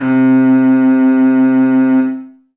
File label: Korg M3R Quartet C3